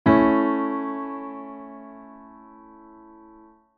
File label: A major chord